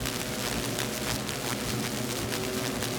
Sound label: laser bottom rays